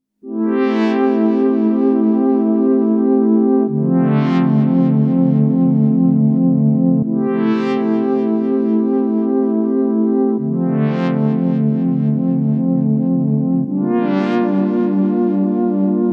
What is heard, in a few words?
Sh-4d Model Chord Cloud Ripples